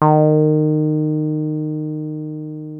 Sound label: 303 D#3, TB 303, Synthesizer; Hollywood Edge; Giorgio Moroder Rare Synthesizer Collection